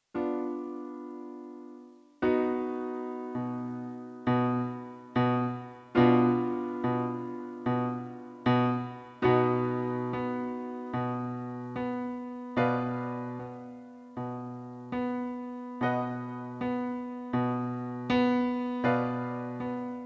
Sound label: piano